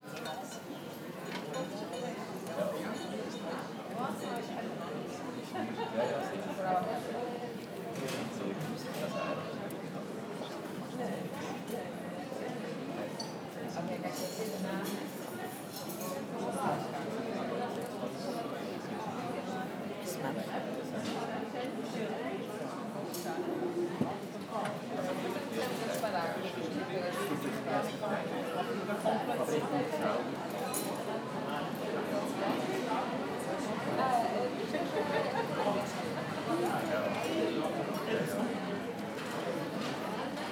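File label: EXTERIOR AMBIENCE Street Cafe, Zurich Switzerland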